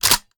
reload empty start close, sh; reloads; iw8; romeo870; gmod

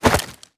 melee attack gun; viper

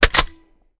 reload empty chamber; reloads; gmod; iw8

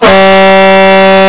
truck horn; gmod; tdmcars

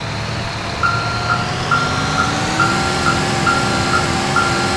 first gear reverse, vehicles; phantom

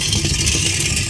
blowout, tire; gmod; svmod

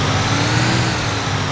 first, vehicles; phantom

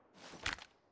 ads down

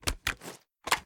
reload empty end

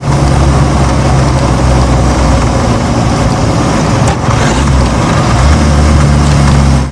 throttle off, vehicles; defender

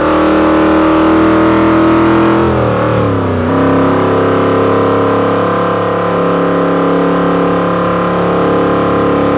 fourth cruise, vehicles; gmod; tdmcars; grand che

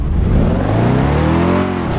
first, vehicles; typhoon

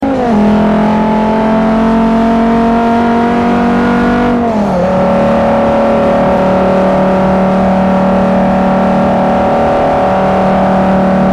fourth cruise, vehicles; focusrs

